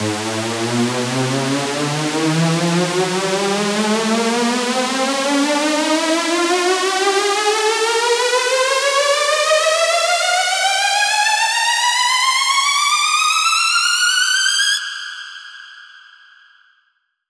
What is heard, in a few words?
Saw Ab 8 Bars (Higher)